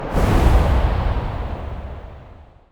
Explosion Wave; KriptoFX; Realistic Effects Pack v1

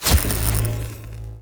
Explosion Lightning; KriptoFX; Realistic Effects Pack v1

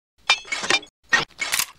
RPG Reload; Invector-3rdPersonController; Shooter; Weapons

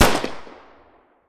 Handgun Fire; Invector-3rdPersonController; Shooter; Weapons

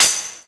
Explosion Chain; KriptoFX; Realistic Effects Pack v1

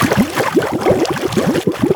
Loop Water; KriptoFX; Realistic Effects Pack v1; PARTICLES

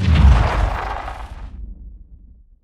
Explosion Fire; KriptoFX; Realistic Effects Pack v1

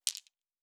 Objects Small, Fantasy Interface Sounds

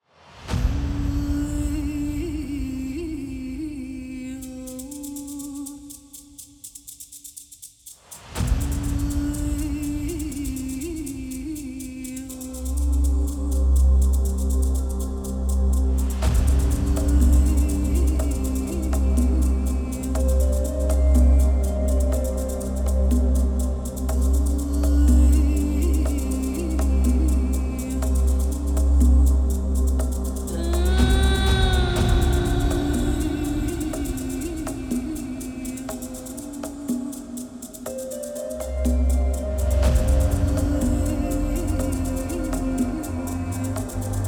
intro scene sad; rough mix

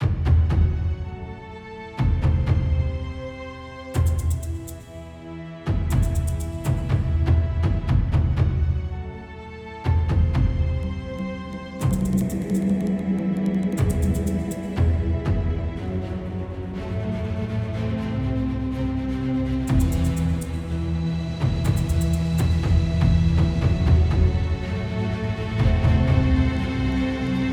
simple tension; rough mix

